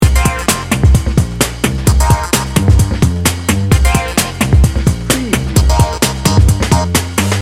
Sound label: bouncy hip hop loop